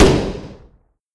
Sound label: shooting